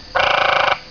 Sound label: canyon treefrog call; texas nature trackers; amphibian watch